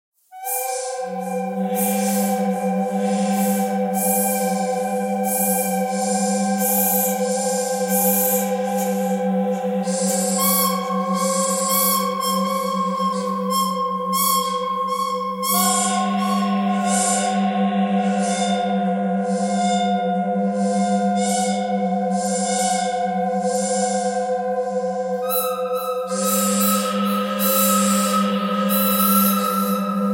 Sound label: bird canyon bird canyon pentatonic